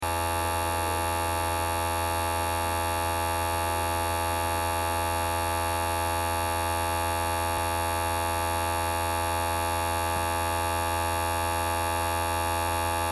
computer CD recorder badly fixed mid-copy

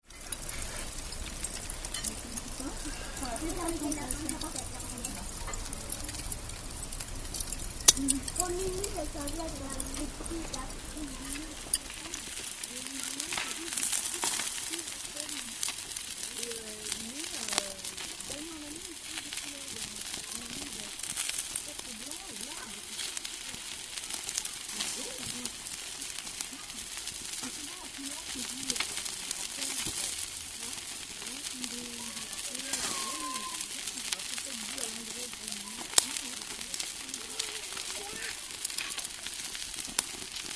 barbecue

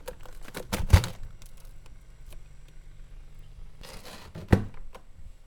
opening crusted freezer

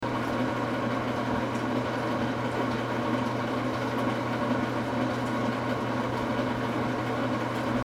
washing machine

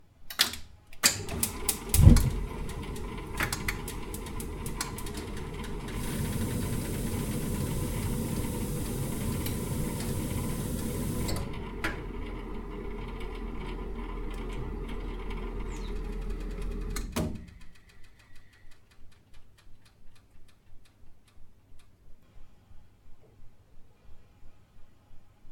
gas heater boiler

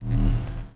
footsteps energy

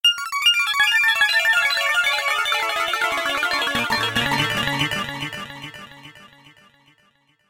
arpeggios arpeggio; zasfx

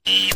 bounce

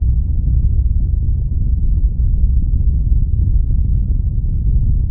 grumbling drone

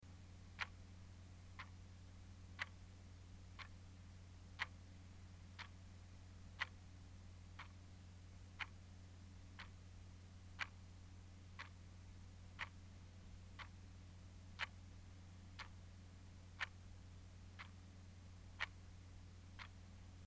alarm clock ticking

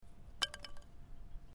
light wood piece

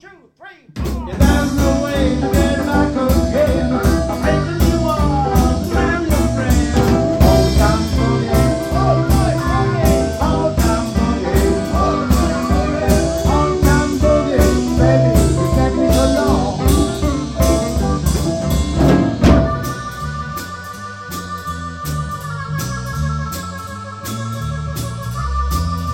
Old time Boogie, solo harmo

